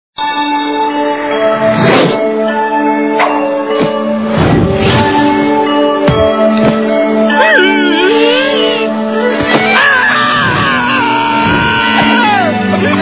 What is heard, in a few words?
swing